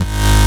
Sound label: Bass Shot G#; Mutekki Media; Vengeance Electroshock Vol.2; Bass Shots